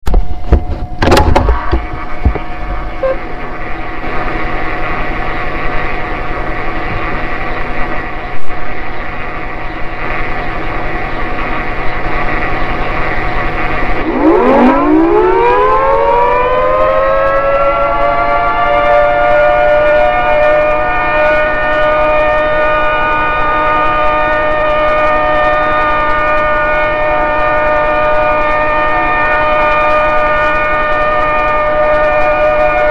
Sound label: Dupree 3T22 Alert, sirens